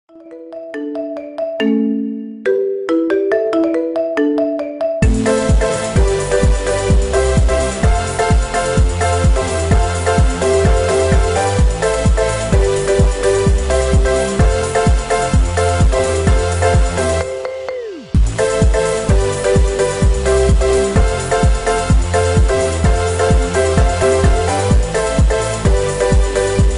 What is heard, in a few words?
marimba remix ringtone